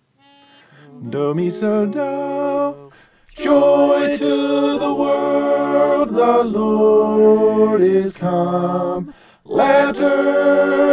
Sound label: tenor